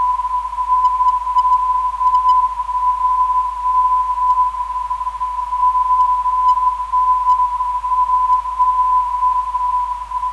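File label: 326kHz, non-directional beacon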